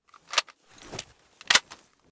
ump45 misfire, weapons

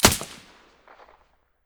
aug silencer stereo, weapons; STALKER; IWP

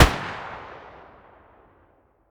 muzzle pkm mono far, weapons

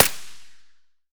ak74 silencer distant, weapons; STALKER; IWP

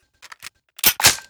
protecta open empty, weapons

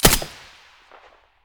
m4a1 silencer stereo, weapons

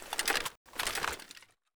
torch use; S.T.A.L.K.E.R.; IWP; item usage; pkm

